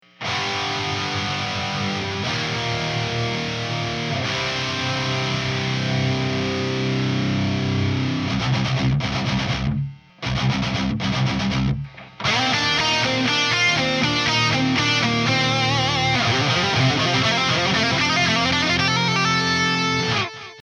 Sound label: amp